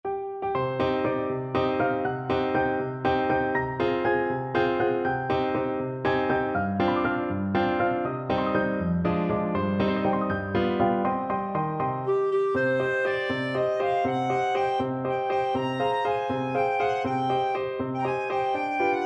terciopelo, clarinet

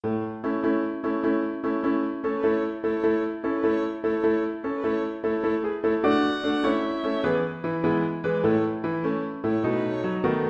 Overture violin; Light Cav Overture Suppe

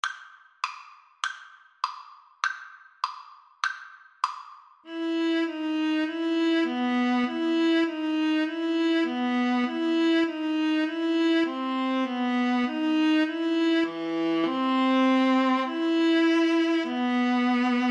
viola duet

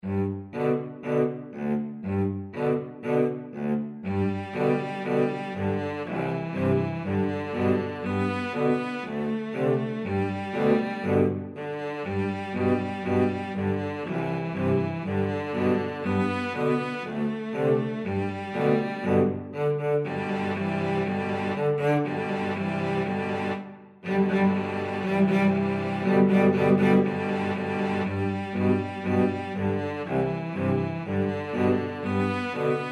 cello quartet

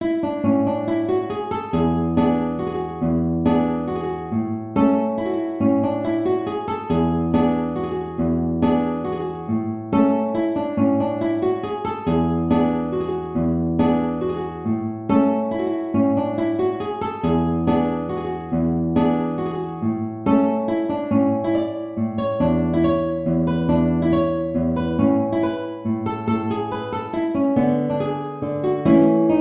Waltz, guitar; Strauss

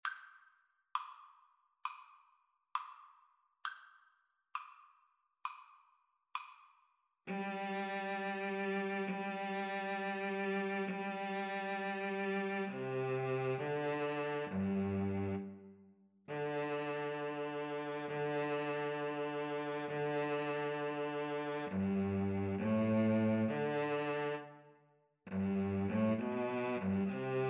pastorale, Flute Cello Duet

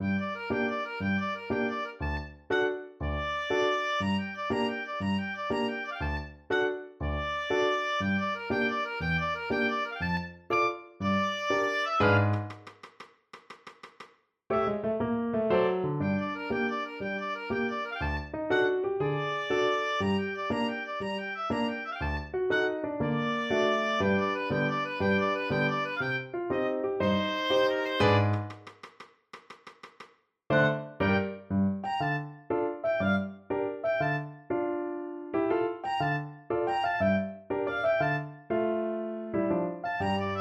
clog dance, oboe

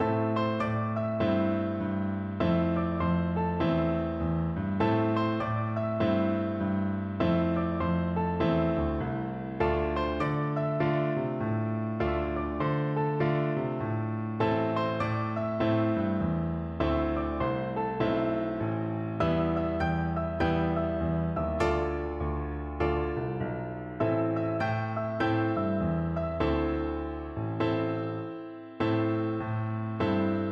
piano duet

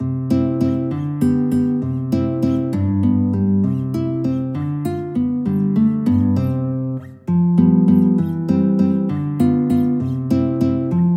walzer, guitar; carulli

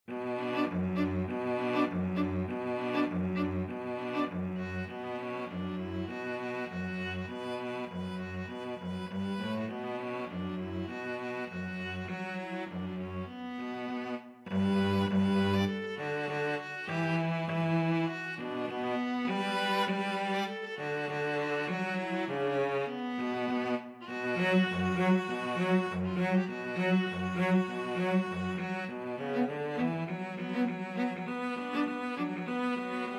viola cello duet; wellerman

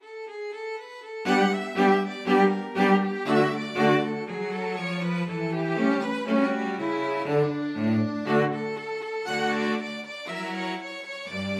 string quartet

